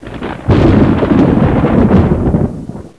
Vortex Ready; NETSTORM

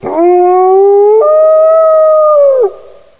wolf

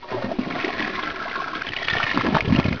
Flush; Flying Toilets Demo

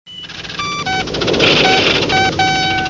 computer-on; sfx; Super Games Pak; Odyssey

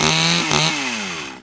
saw cut, grinder; PC Gamer IT CD 45; SMANDEMO; baddys

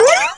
stretch; lomax

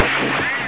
GUNSHO; Taifun

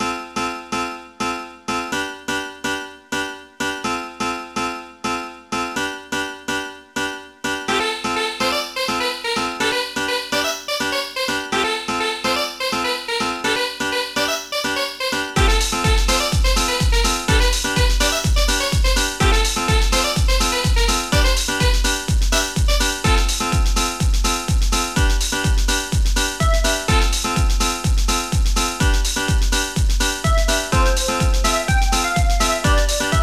bonusmusic; bazzan runt 2

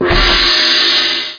SWITCH; Gex

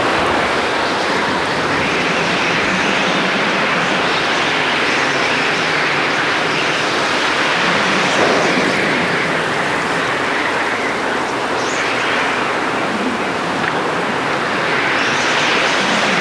wind

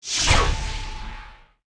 UFO BOMB; disasters

